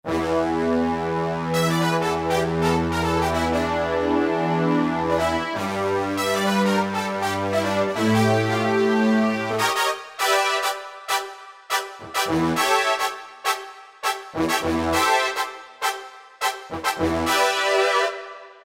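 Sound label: Brass Section, Yamaha EX5R; Factory presets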